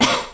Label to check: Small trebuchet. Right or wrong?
Wrong